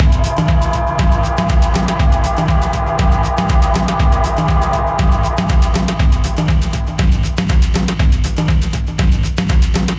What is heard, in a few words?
Music Inner sanctum timer beat